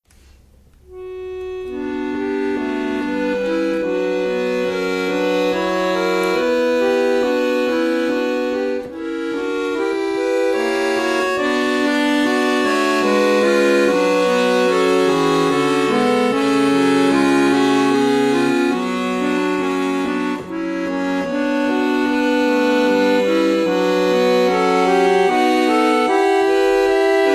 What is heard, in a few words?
Scarlatti Sonata in H-Moll